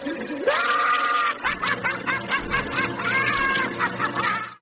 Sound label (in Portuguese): risada; joker